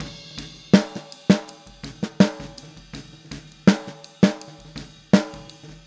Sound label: Boogaloo Chorus Snare, 82 BPM